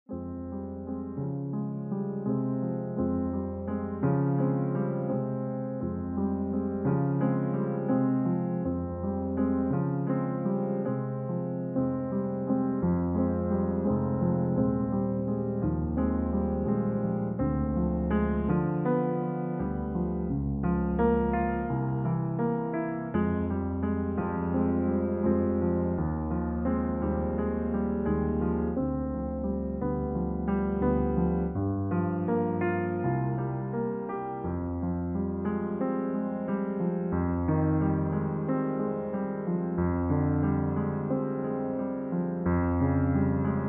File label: Fm piano play